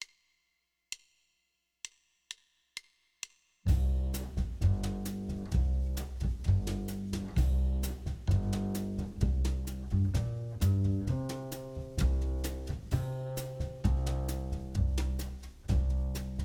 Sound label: Backing Track